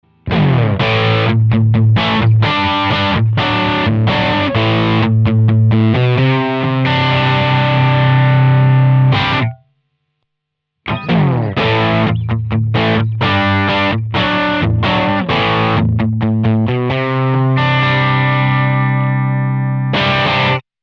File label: Amp USA Twin Power Amp Dist; Simlpifier; Amp AB